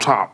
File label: Vocal Oneshots; Vengeance Samples; Vocals